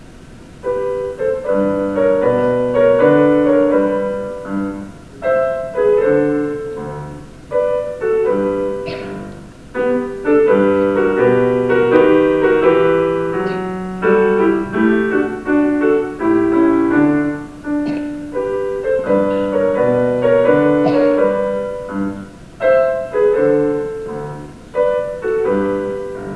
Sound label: menuetto, piano at the festival